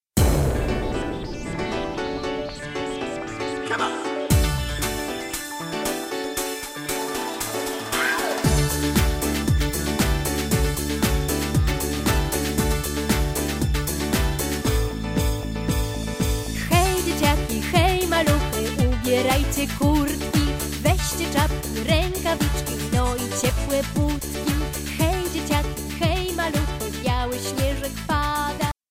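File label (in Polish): vocal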